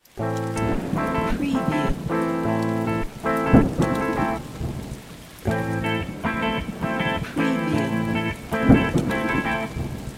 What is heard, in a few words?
RTM Bastaki Am 105BPM, electric guitar; 6-8; beatcraftpro